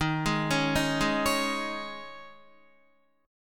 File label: Eb 7th Suspended 4th Eb, Guitar; Eb7sus4; arpeggiated